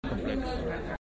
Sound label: loop